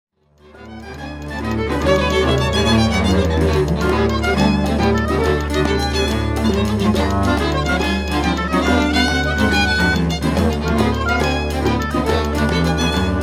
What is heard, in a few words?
Blues